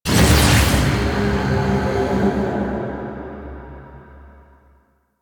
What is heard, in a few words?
Shrill Pickup; Warframe; Entrati